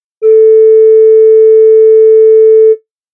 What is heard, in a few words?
good tune